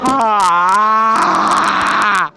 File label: ahhhhhhhhhhhhhhhhhhhhhhh